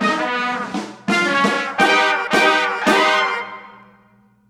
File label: Shorter Uh Oh; The Act; Arcade, iOS, MacOS, Mobile